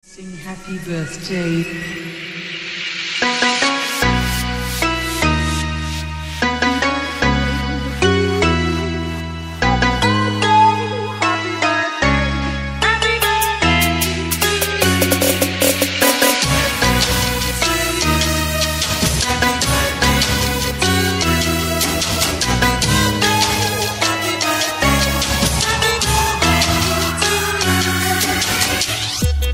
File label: happy birthday trap remix; Tik Tok